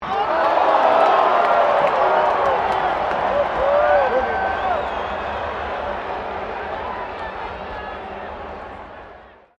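crowd 15K Rugby World Cup boo, Rugby world cup game; Crowds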